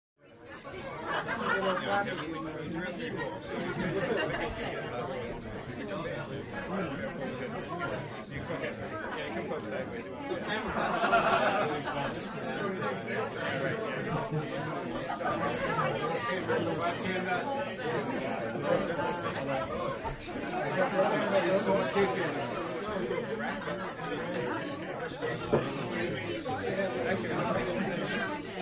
Bar Ambience, 30 People In Small Busy Bar, Mixed, Happy Chatter with Occasional Glass Clinks, Close To Medium Perspective.